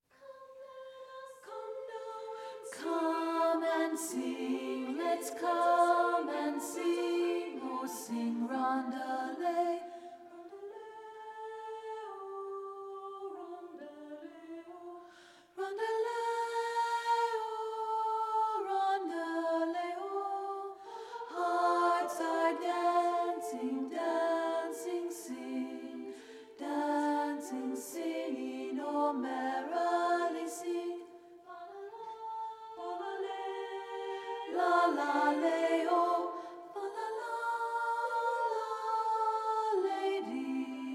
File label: ALTO